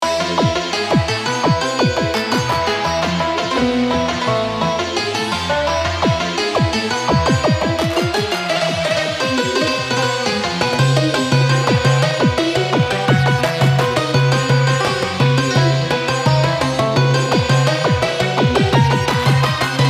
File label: PYRAMID